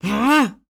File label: Male Attack Run; SandBox Musics